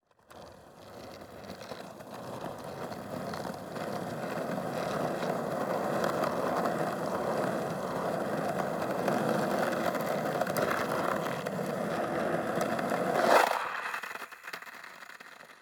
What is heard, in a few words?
Longboard